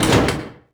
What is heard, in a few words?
button; oklahoma